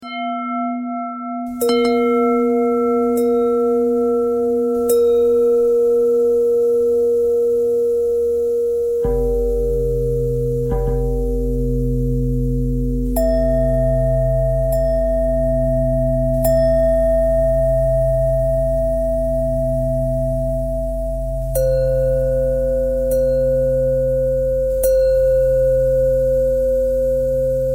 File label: bell thread bend thread